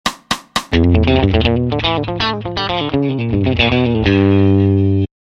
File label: Mixolydian Mayhem Lick; Fast